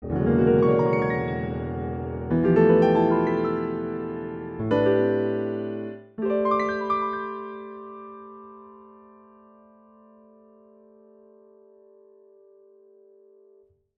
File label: Piano